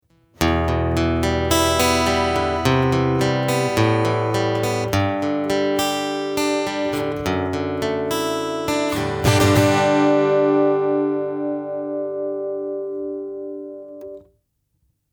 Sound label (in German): Acoustic Gig EQ Kompressor; Carl Martin